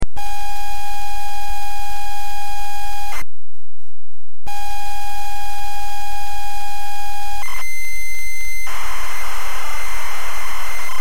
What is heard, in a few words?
signal